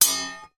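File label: warfare sword hit another fight; zapsplat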